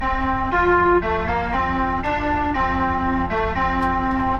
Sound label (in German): Melodie